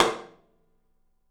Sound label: TOM; 3D Sound; 3D Drums